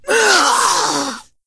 Wound Crit; Ionar

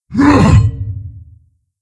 Attack, Creature; Steelbreaker

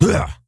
Stonebark Attack; Elder Stonebark

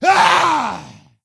attack; Skadi The Ruthless

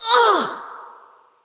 Creature Wound Light; Fjola

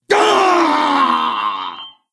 Wound Crit; Stormcaller Brundir

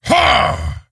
Attack; Kologarn

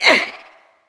Attack Heavy, Creature; Eydis

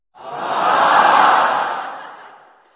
Audience Reaction; Barnes The Stage Manager

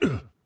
Wound; Captain Rupert

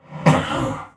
Wound; Deathbringer Saurfang

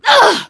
Wound; Sif

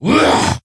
attack, creature; Shadron

